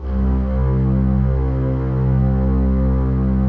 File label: PAD, ATMO PADS; Best Service; XX Large